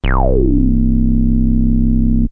reso down

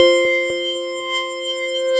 Synthesizer Middle C Notes, Lead

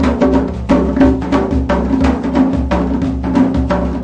120 bpm, mideast complex, drum loops and breaks

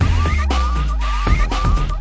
119 bpm bigbeat siren

